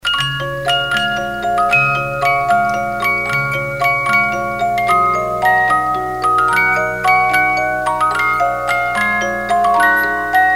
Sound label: 50-Note Movements, music box; Artists Life; Kunstlerleben